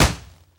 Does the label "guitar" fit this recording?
No